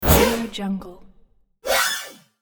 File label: cartoon repair